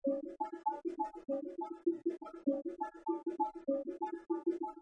Bongo

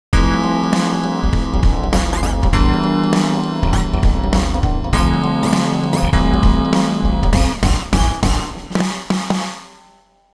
Pop; TSMtoolbox

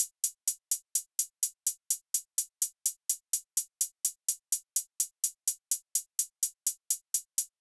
Techno Drum HH

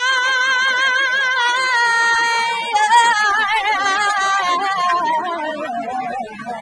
Vocals Castanets Applause Harm

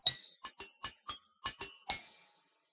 Glockenspiel

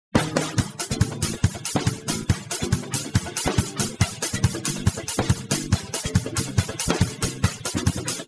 Jazz

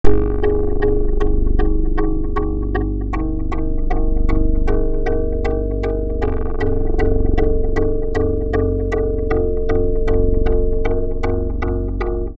Synth Poly; TSMtoolbox

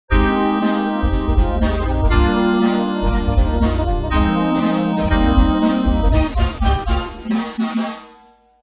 Pop

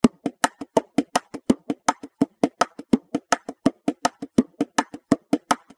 Bongo

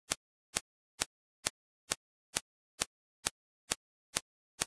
Vibrato Impulses Noise Perc

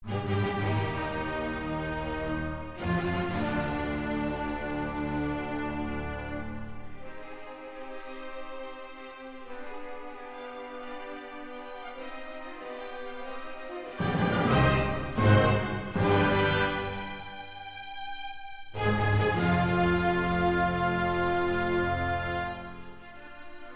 Sync Wavetable Soni

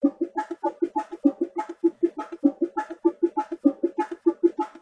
Bongo

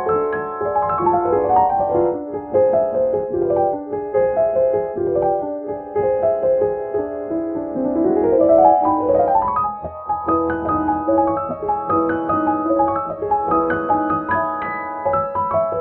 Ravel Jeux D Eau rightHand SeparateRestore

